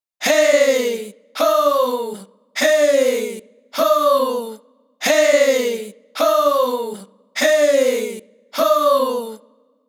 adlib Hey Hoo 100 wet, Raw Vocals; Slate Digital Omnivox Vocal Sample Pack; Adlibs

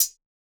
hat spice, Closed Hat; Hip-Hop; Drum One Shots; SD CAICOS Hip-Hop Sample Pack; Slate

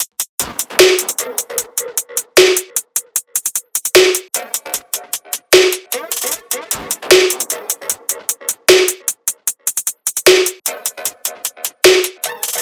tops 152, Drum Sample Pack Loops Top Loops; SD SEISMIC; Slate

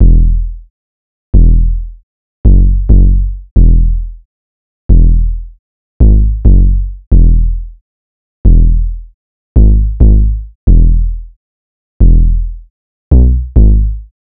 808 loop 135 E; Slate Digital BASSHOLE Sample Pack; 808 Loops

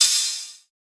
hat brite, Open Hat, Drum One Shots; SD MIDNIGHT Sample Pack; Slate

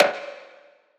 perc flange a lot; Drum Sample Pack; One Shots; Slate; SEISMIC

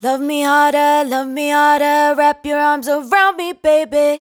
hook 110 Gm, Dry Vocals; Raw Vocals; Slate Digital Omnivox Vocal Sample Pack

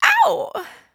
"Oww", adlib, Dry Vocals; Raw Vocals; Slate Digital Omnivox Vocal Sample Pack; Adlibs

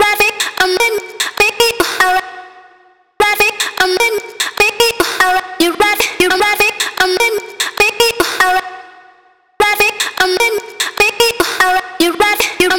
vocal chop, 150, F#; Playground; Slate Digital Omnivox Vocal Sample Pack; Chops

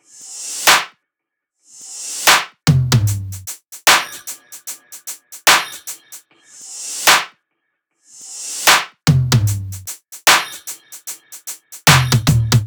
tops 150, Drum Sample Pack Loops Top Loops; SD SEISMIC; Slate

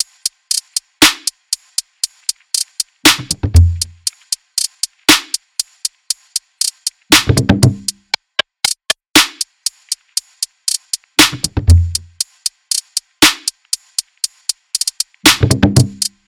tops 118, Drum Sample Pack Loops Top Loops; SD SEISMIC; Slate

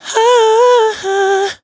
riff flips Em, Dry Vocals; Raw Vocals; Slate Digital Omnivox Vocal Sample Pack

